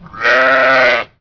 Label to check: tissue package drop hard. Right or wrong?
Wrong